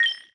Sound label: Hub-Train Screen-Ui